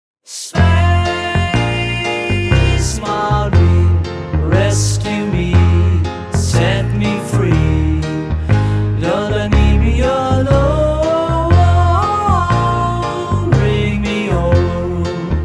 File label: Vocal Test